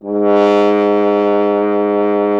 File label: F. Horn; Miroslav Vitous; Solo Instruments VOL-2